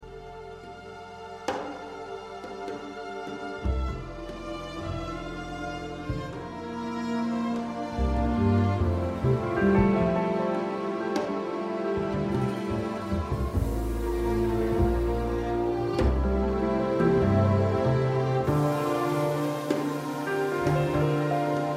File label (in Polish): nokturn F-dur op. 15 nr 1